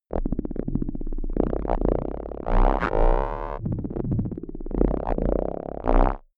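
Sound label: juicy rattle sequence, science fiction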